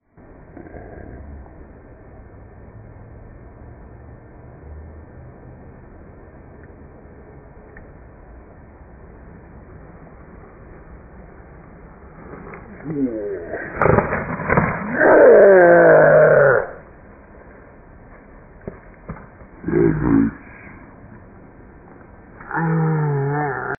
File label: fat jump